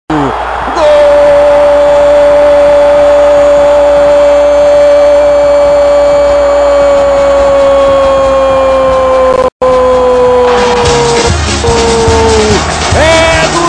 torcidas gol do sport; gtk torcidas

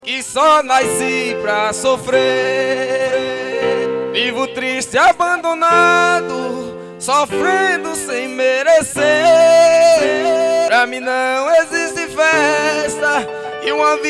toada

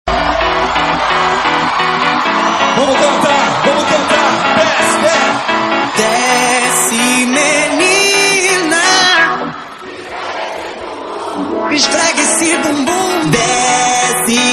acelerada ao vivo; vivo rio

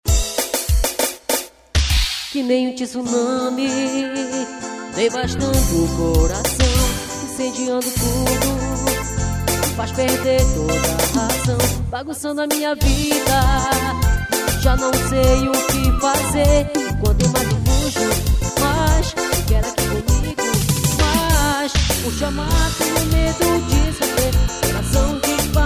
show ao vivo

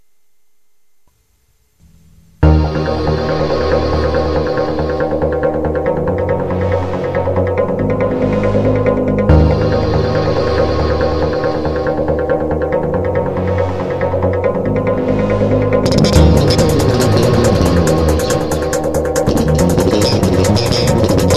pagode

